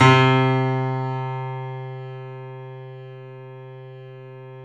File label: 3D Pianos Boesendorfer; E-MU Producer Series Vol. 5; 3-D Audio Collection